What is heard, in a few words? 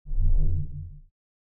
space shield sounds